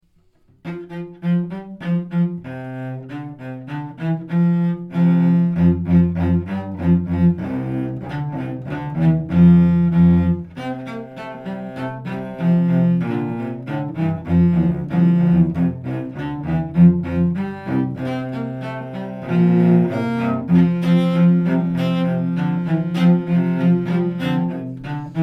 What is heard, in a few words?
Cello Trio; Good King Wenceslas